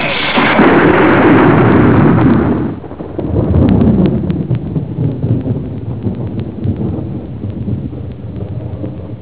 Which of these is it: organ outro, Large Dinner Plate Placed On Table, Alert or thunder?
thunder